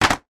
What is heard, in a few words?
card chocolate filled advent calender put down on table; foley